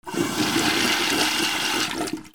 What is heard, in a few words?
BATHROOM